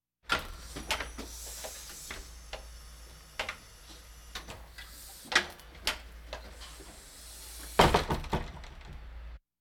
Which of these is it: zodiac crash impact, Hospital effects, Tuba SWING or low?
Hospital effects